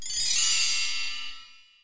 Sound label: TINKLE; EARTH